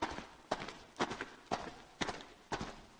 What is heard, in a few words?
footsteps ghoul; Be Cool Scooby-Doo! - It's Dark Out There; ThrillGames